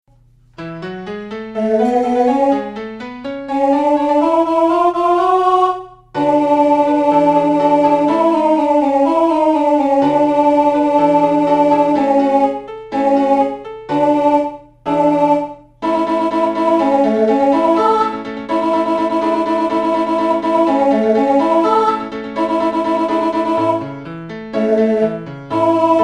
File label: mozart alto H; lyon